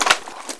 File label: mp5 clip; weapons